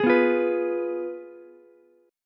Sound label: F Diminished Ukulele; Fdim strummed; JGuitar